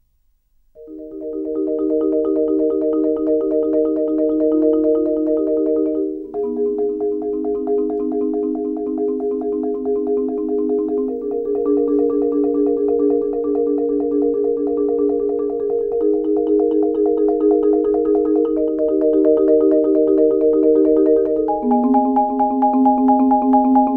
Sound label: marimba; audiodrama